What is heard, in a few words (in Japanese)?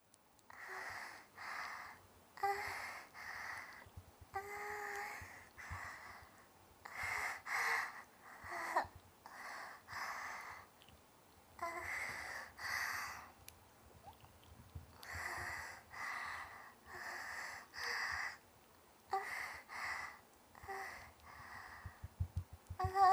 欲求不満なOLのオナニー 喘ぎ声とマンコの音